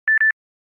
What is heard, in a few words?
Beep Beep; Samsung Z2; Ringtones